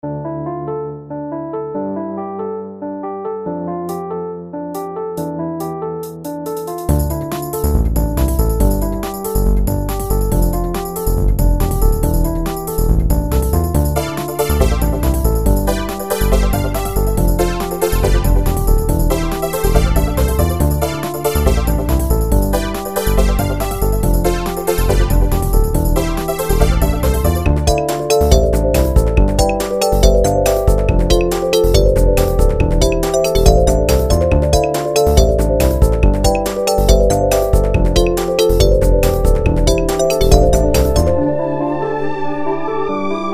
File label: funky techno